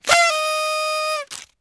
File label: party horn; soundboard